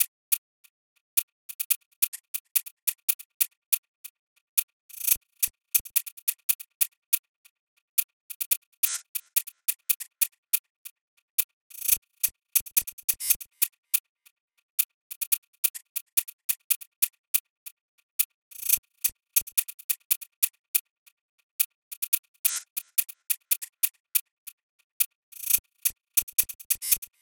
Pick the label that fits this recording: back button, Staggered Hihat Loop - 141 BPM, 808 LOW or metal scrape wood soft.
Staggered Hihat Loop - 141 BPM